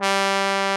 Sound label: TROMBO, Trombone; Solo Brass